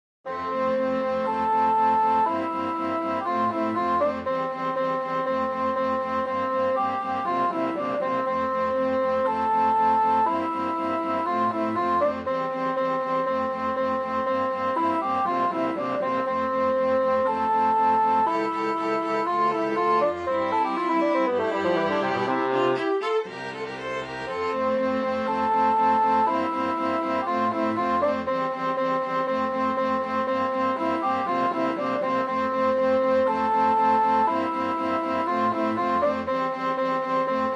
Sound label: Quartet